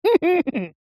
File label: cartoon voice high pitched laugh smug